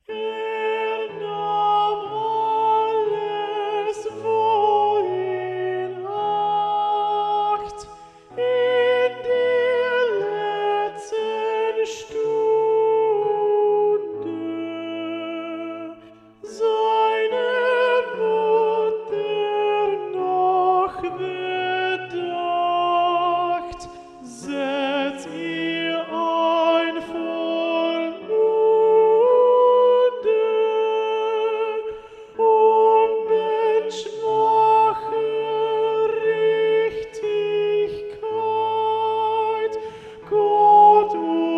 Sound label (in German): Er nahm alles Alto